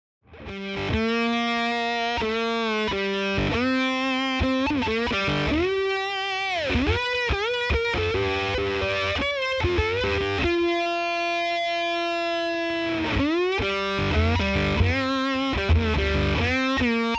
slide

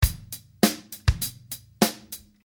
pan drum

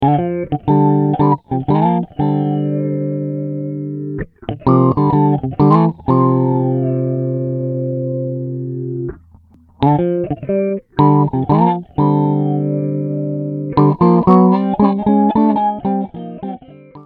Bass Balls

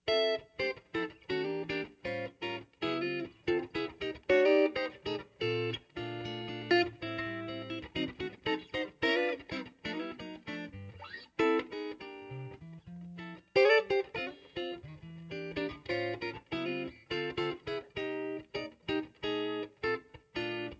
Ragin Cajun Jazz Strat

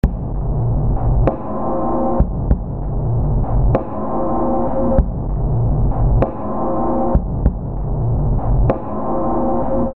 Crusher Limiter ON

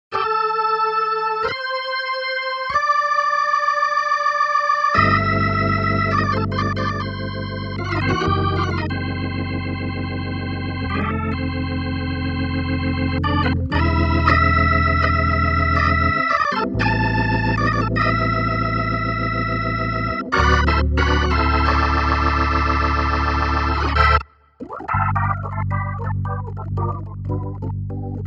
nord c1

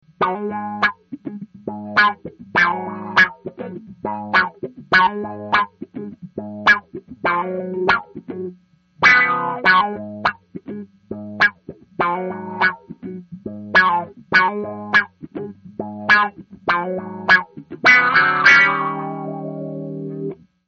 d filter up

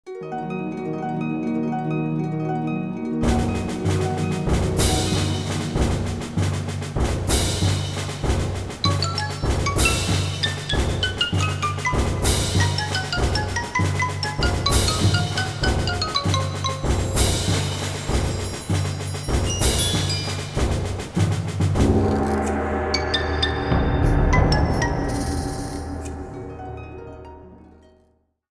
perc and harp

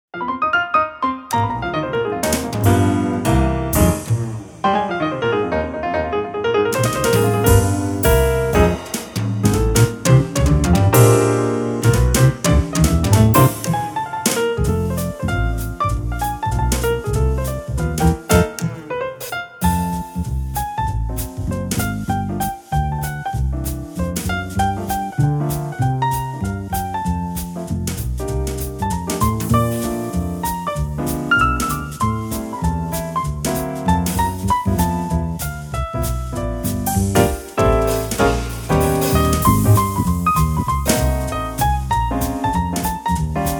Piano Trio

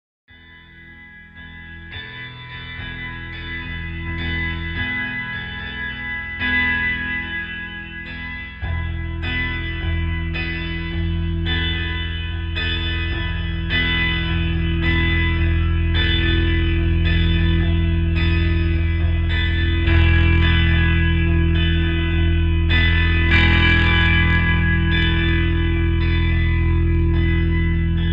octave harmonics; POG

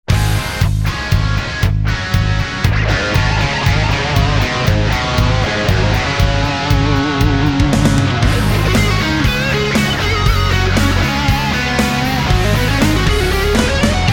brit

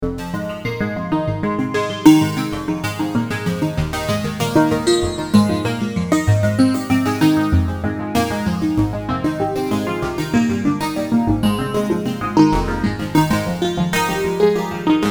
wavetable

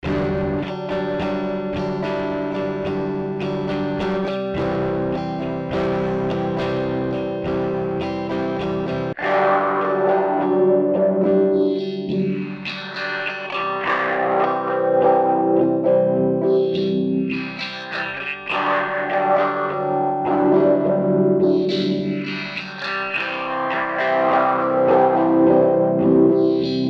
swirling cascades

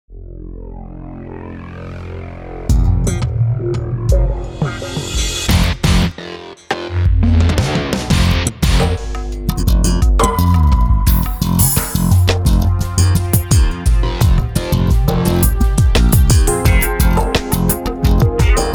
Virtual Bassist Demo Song

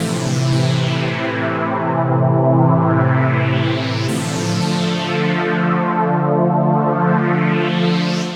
synth pad

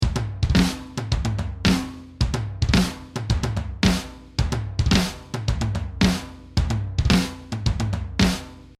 Nu Metal 110

